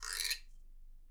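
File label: guiro, Percussion; Miroslav Vitous - Percussion & Harp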